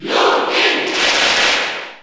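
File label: Cheer Italian, crowd; SSBU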